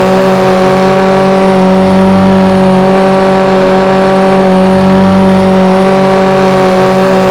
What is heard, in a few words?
high, engines; SKYLINE